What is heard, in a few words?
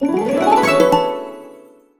Money Trail Animation; 1 shot; SpongeBob Krusty Cook-Off; android